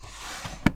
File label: drawer close